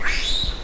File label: Scream